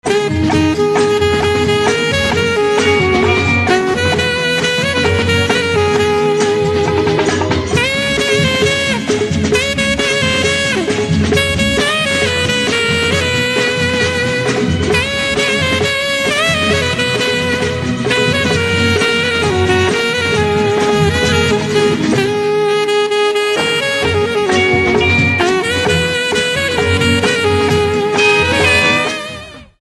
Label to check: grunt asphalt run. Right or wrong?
Wrong